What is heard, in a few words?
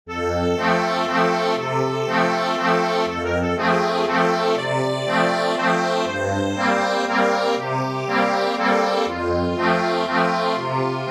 accordion